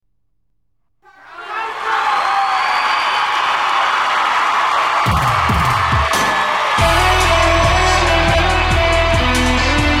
Guitar Cover

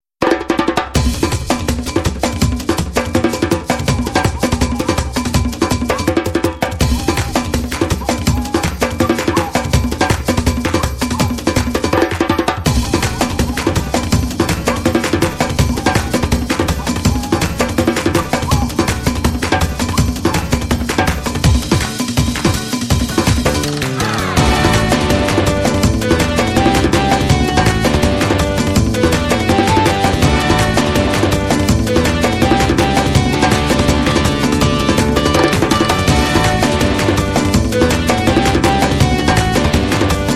Trailer Music; xbox 360; Sonic Unleashed

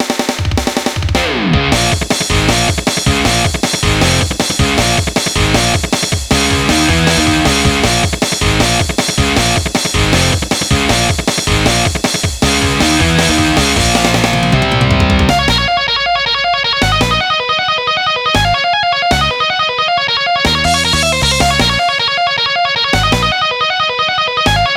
Metal; animal crossing

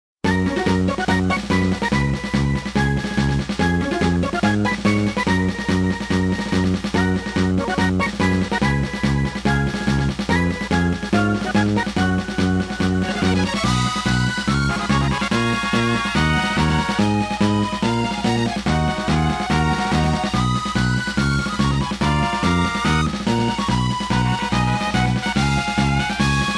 Nature Forest Area; nintendo wii; super smash bros brawl

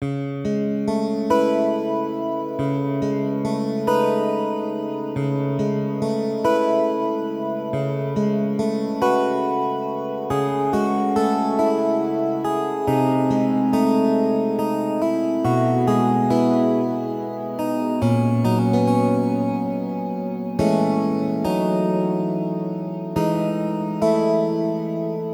8 String Version; da capo

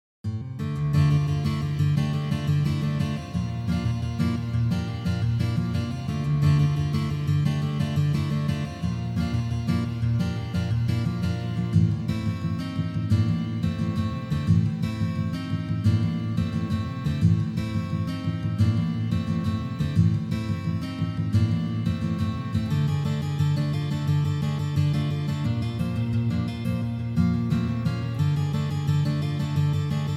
Duet; castlevania

